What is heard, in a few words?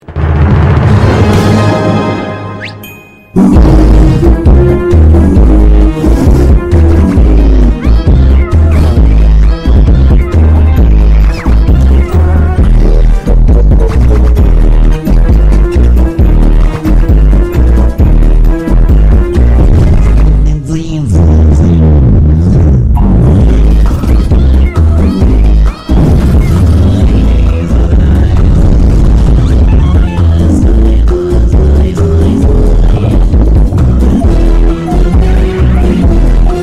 bass